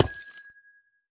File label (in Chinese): 紫水晶破坏声